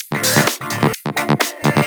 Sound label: 128BPM Melody; Vengeance Samples; VFH1 128BPM Basique Ale Kit